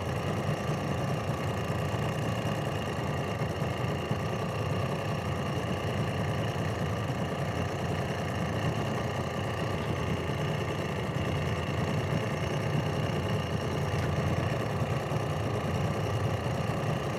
vehicle small boat idle; teardown ps5 windows xbox series xs gamerip 2022

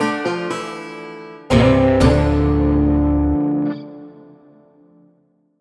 mission fail; Western

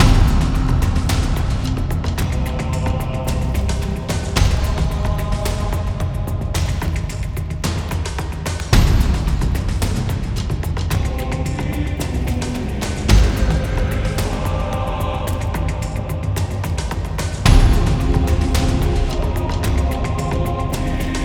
Dungeon World Idle LOOP

